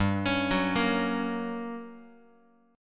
G Minor Major 7th Flat 5th G, Guitar, 4A, 4E, 5A, 5D, 5G sharp, 6C sharp; arpeggiated; x,3,4,4,2,x